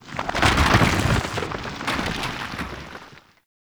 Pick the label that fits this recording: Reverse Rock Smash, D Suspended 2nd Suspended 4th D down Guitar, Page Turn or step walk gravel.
Reverse Rock Smash